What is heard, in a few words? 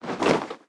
Chair